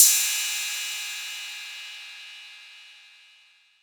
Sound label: crash d sharp key, cymbal; drum sample; single hit; one shot